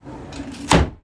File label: door close; Golden Crayon Sounds